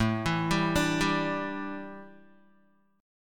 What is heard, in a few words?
A 7th Suspended 4th A Guitar, chord; A7sus4 arpeggiated; 4E,4A,5D,5G,5C,6F